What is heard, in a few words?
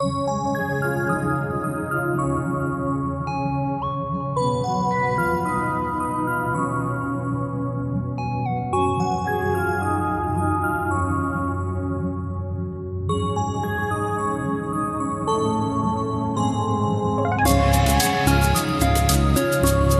Game Music; Sprill Aqua Adventures